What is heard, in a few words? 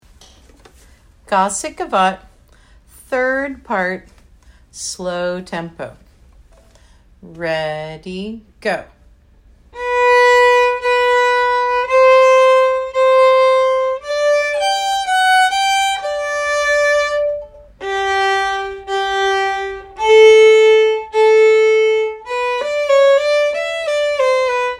gavotte slow tempo; Gossec